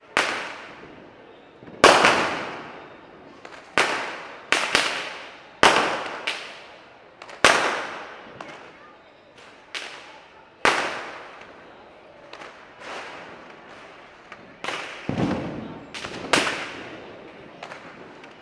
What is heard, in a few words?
fireworks street urban; sound effects one